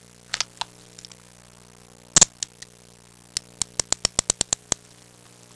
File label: handcuff; Sound Effects for Multimedia - Volume 1